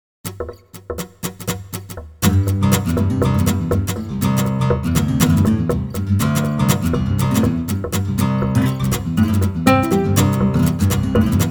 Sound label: guitar; nemoguitar